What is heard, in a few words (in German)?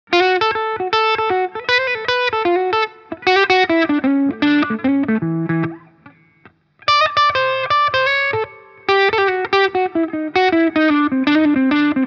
Gretsch G2622T crunch Hals